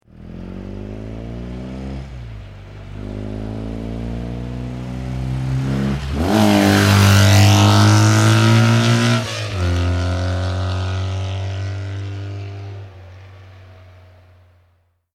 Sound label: Motorbike BMW up and pass, BMW 1100 up and past medium fast; Motorcycles; Tom Hutchings